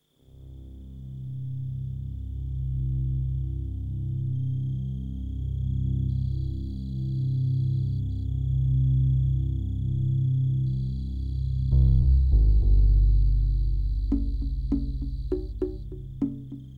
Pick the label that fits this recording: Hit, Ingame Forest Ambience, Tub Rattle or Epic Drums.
Ingame Forest Ambience